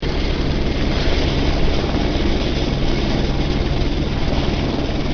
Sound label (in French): helico